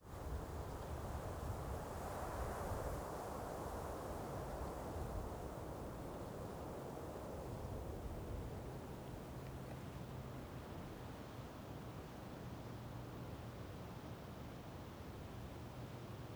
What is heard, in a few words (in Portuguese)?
Ambiencia em Campo Aberto ao lado da Cachoeira com Vento; Vento Variando; ambientes naturais; Coleção Sonora do Cerrado